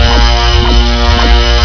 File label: beam run, weapons